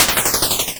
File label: Cry